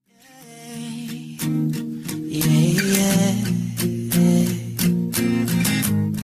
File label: Melody, RingTune